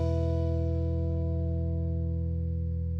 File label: Bass or guitar E4; 音MAD; 孤独摇滚